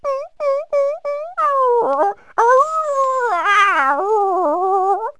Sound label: dog yelp; animals; Wired for Sound Pro CD; Aristosoft, Inc.